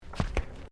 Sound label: FOOTSTEPS THONGS (FLIPFLOPS) TILES STEP; Footstep Foley; Footsteps (Sound Effects:Human)